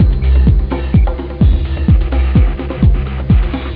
tribal

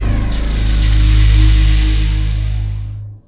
shrine; Heretic II; items

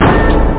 wierd drum, drumkit; OctaMED SoundStudio V1

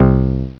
6-way game button press; Boxcars Casino

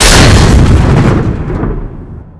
explo gas tank; Spearhead Demo; MacFormat 2003 June

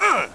Player hit; Mutant Dungeon VR

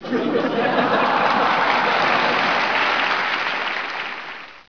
ampitheatre; CAESAR3

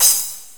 Splash Cymbal; GMPlay; Drums; XG

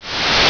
TV Power On; iPoker 2000

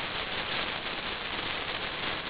fuse; kabloey redux

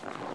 snow; The Sum of All Fears

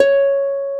E HARP C#; Timidity

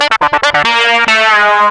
floor

